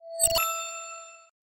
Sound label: toggle sound